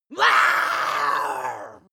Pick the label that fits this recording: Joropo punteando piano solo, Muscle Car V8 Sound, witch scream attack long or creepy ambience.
witch scream attack long